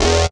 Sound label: DR. LOOP, BUZZ; X-Static Goldmine 3